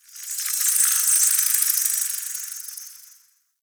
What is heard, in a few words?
Insect Buzz; Warframe; Eidolon Plains; Ambience